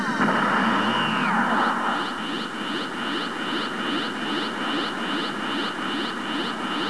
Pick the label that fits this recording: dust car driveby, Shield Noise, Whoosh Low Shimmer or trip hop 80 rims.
Shield Noise